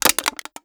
tin can, crushables; oneshot; Half Life Alyx

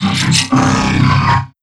dry, strider, combat, movement grunt

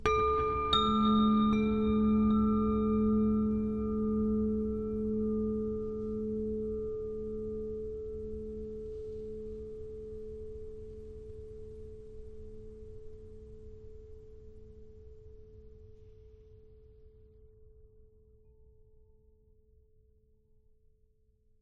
ambient abstract chimes low; Half Life Model Pack

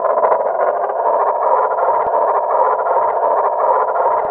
move slow loop, roller mine; Half Life 2

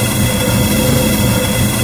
wood plank scrape rough loop; Half Life 2

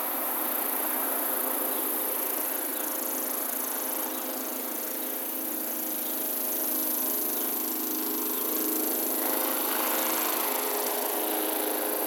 exterior; inferno

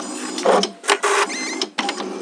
equip, ambient tones

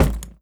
impact, luggage big

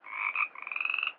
frog

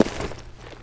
footsteps, tile; player

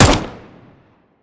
usp unsilenced, weapons; Counter Strike Model Pack; CS:GO

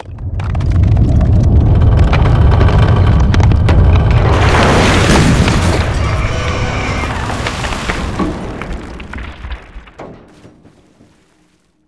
bridge break; ambient; Half Life 2; Episode 2; outland

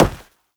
step run, footsteps, player, carpet

